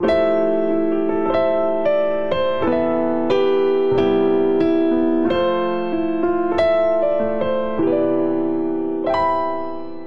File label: Progression Light Moving Melodic IV - I C Major 92 bpm, Grand Piano; MIDI Clips; Ableton; Factory Packs